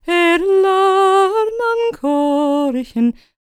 GAELIC, World Voices, GAELIC FEM

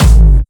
Distortion Kicks, Bassdrums

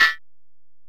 EPerc, Alesis DM-PRO

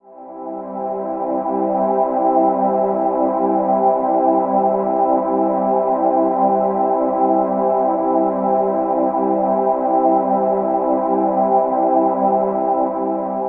Major Mist C, Ambient Pads; Zero-G Seismic Frequencies; 90 s Sample CDs

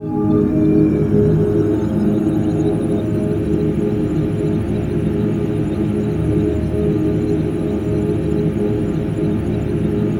ATM SYNTH; Best Service - XXL Production Effects

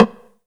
DRMS, Pure Electro; USB Soundscan vol.03; AKAI

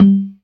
PERC, ORGAN; Keith Emerson Signature Series

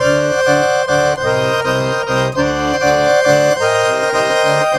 Balkanish Traditions; USB Soundscan vol.63; AKAI

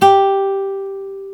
GTR Nylon, Roland; NylonMartin; Club-50 - Foundations

